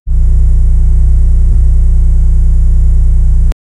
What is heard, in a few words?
electric, bus